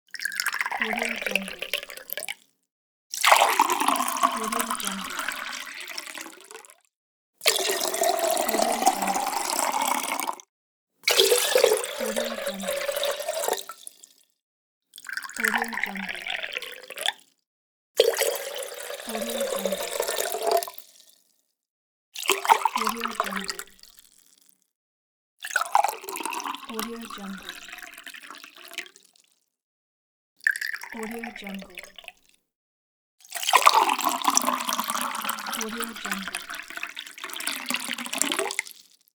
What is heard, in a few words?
pouring